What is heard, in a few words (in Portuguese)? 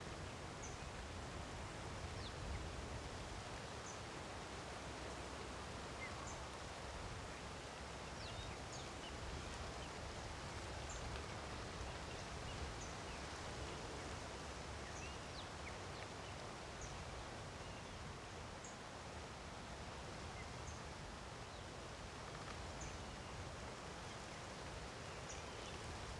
Vento movimentando folhas das arvores